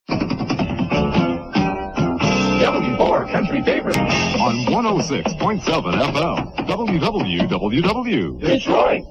Country Top of the Hour ID; WWWW-FM; W4; 1980s and 1990s

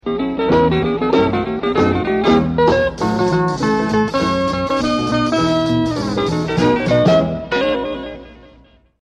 AM Guitar Closing Jingle; WJBK-AM

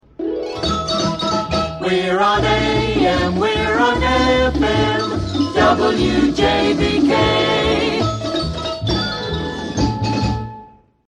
Jingle; WJBK AM FM; Were On AM Were On FM; PAMS

